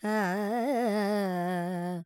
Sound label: Lydian, Vocals, Carnatic Female, Alaps, Dry; 70 G; Sample Banks